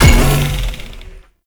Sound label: Bowl Land; Warframe; Grendel; Grendel Deluxe